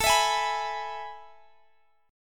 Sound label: A Major 7th Suspended 2nd